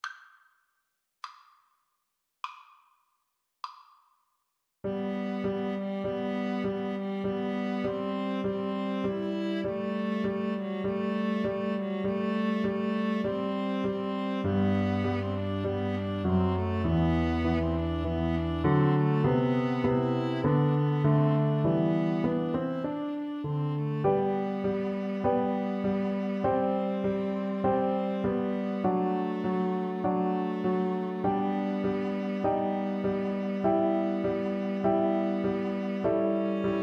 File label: viola viola